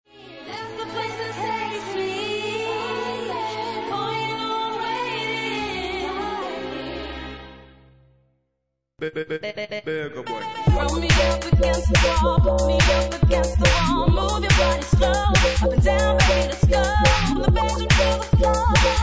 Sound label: Bassline Mayhem